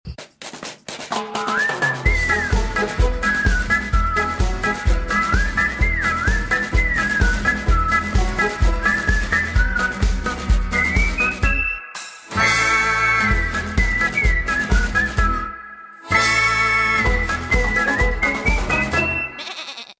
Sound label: closing song; children